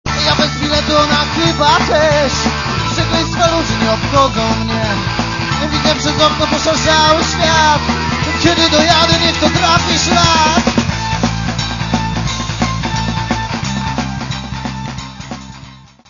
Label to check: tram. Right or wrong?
Right